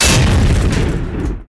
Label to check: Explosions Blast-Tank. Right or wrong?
Right